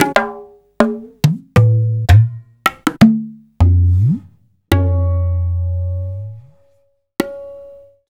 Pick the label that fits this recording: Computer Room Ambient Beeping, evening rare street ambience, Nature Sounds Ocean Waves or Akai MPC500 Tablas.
Akai MPC500 Tablas